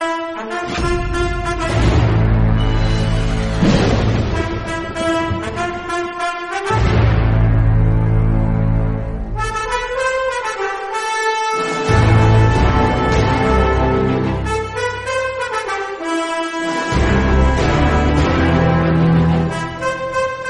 whistle bgm theme; fanaa

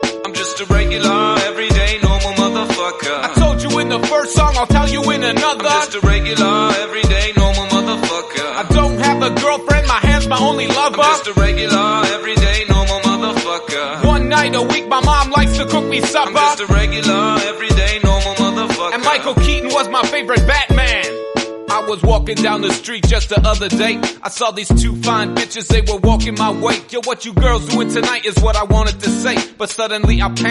english song

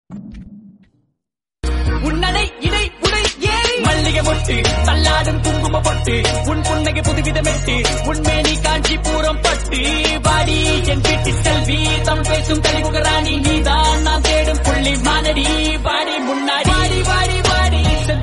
songs tamil

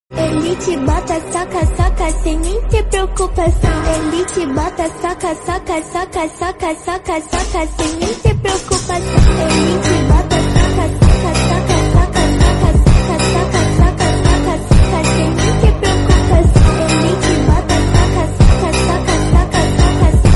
remix bgm

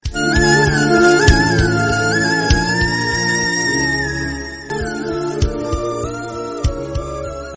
bgm; minnale

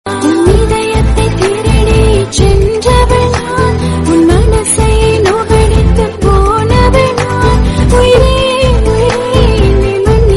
female version

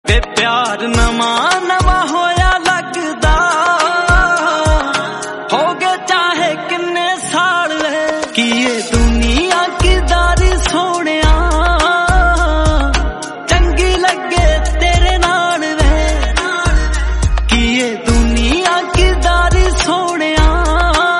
punjabi song

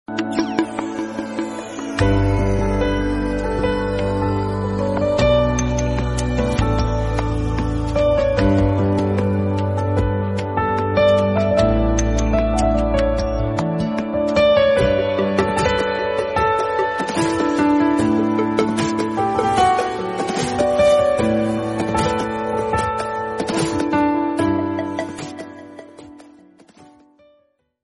instrumental

